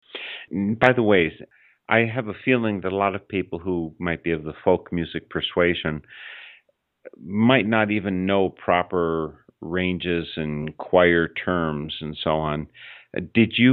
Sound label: choir background